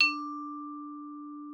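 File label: Xylophone D4; Xsample VOL-4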